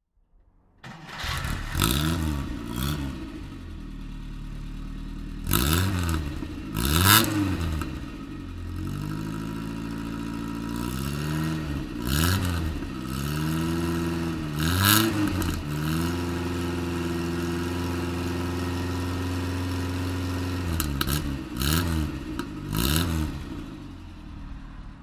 Lancia Ardea

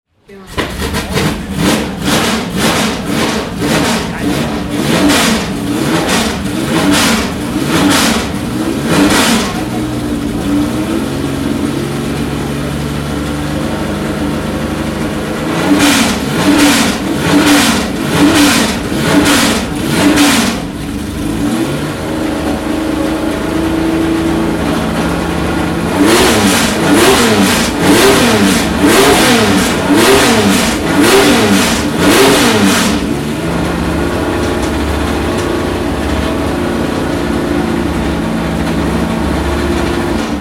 Porsche 911 RSR 30; Porsche Sound Nacht 2013

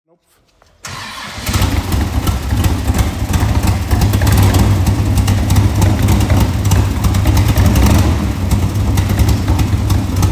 Shelby Cobra 427

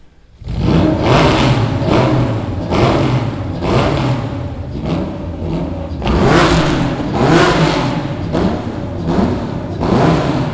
Porsche 911 Carrera 2 Cup

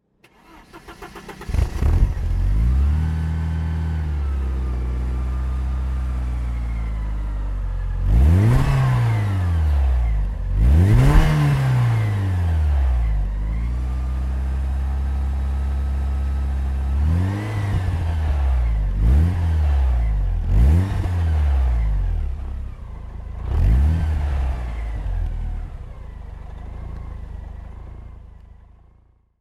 Porsche 944 S2 Cabrio 1989

